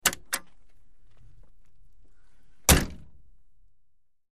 Humvee Door Opens; door, truck

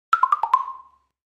Percus Plastic Block, percussion blocks

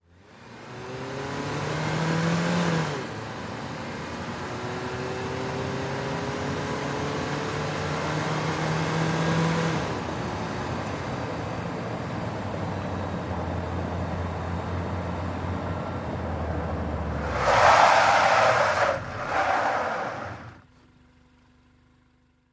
Sm Car Accl Skid, compact

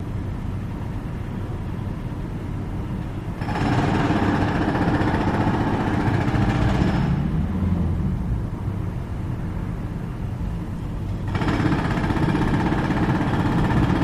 Jackhammer Muffled, construction large

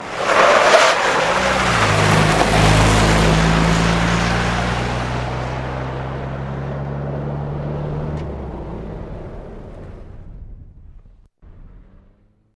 Jeep Cherokee 84 Skid Tur

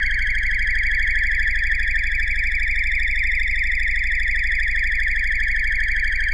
DEEP SPACE SCAN, scanners

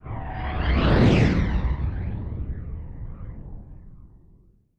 WARP SPEED FLYBY; drones & tones

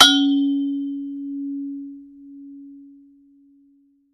Impact Vari Metal, crashes

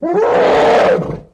Elephant Roar Cut, elephants

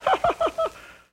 Parrot Chuckle Squaw

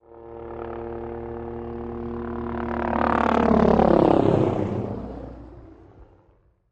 OH6 Loach By Fast Ster; little bird

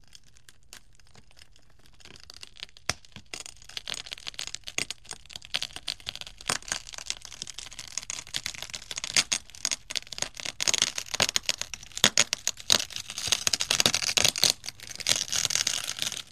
Glass Grinds Creaks; windows & mirrors

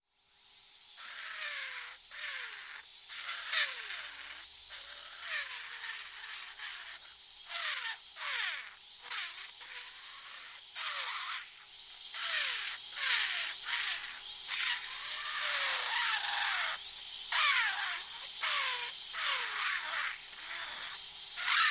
Drilling Tooth, dentist drill

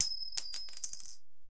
Coin, Large, Fall on Concrete, Short

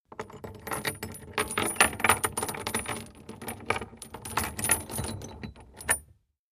Chains Drag Rattle

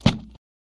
Book Close Thud

